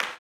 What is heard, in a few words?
CLAP, Blues Soul; Spectrasonics Vocal Planet